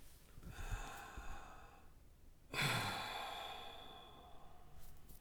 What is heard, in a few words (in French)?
soupir; Index des soupirs; Hadjithomas Joreige